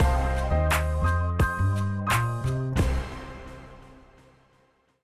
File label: Action